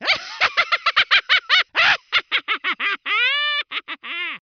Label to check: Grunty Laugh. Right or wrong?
Right